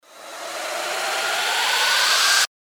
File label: RISER